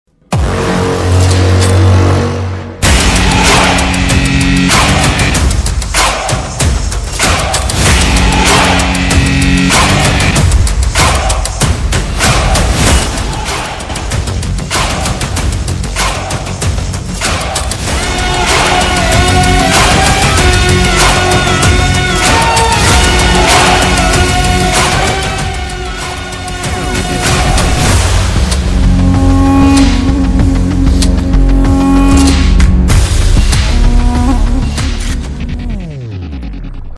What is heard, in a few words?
villain entry bgm gangster bgm mafia bgm